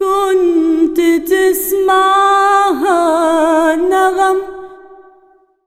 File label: SINGER, Arabian Traditions; USB Soundscan vol.43; AKAI